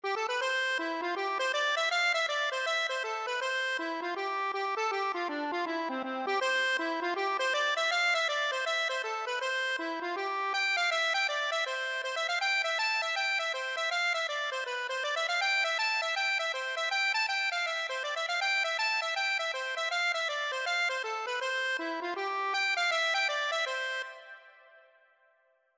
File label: accordion